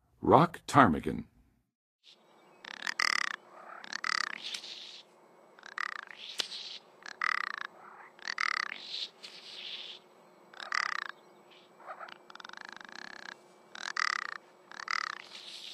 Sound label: Rock Ptarmigan, Birds of North America; Western USA; Western USA Songbirds